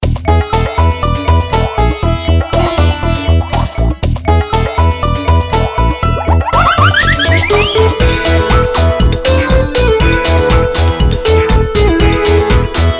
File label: desert